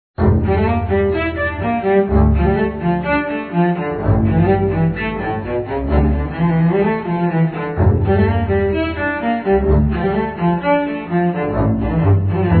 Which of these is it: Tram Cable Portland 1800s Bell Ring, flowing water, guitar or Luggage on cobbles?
guitar